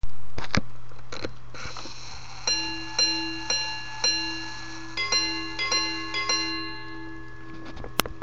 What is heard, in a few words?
gunmetal repeater